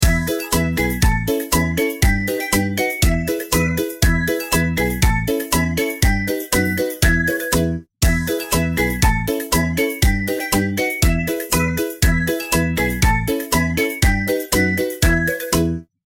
Happy Birthday Cheerful Ringtone

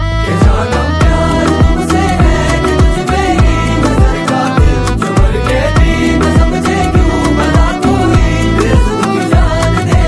Song Ringtone

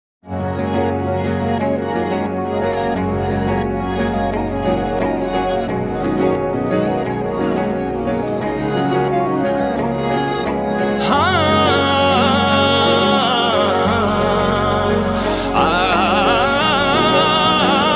Bgm

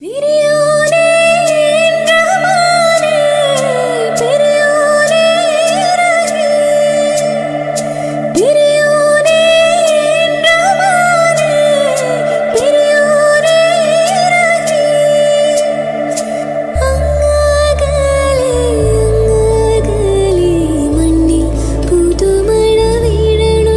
Female Ringtone

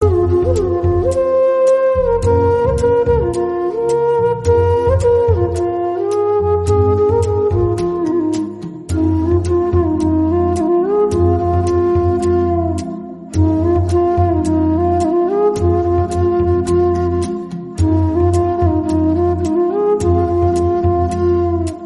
Instrumental Ringtone

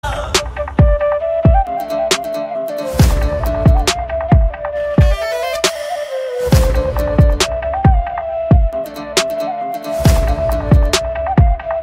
Flute Ringtone

